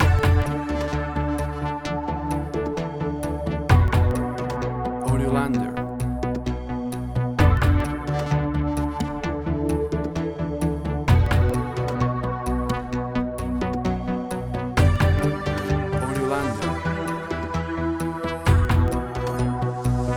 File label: Atmospheric Synth Tension